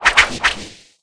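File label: Hit long range air slash; The Denpa Men; Android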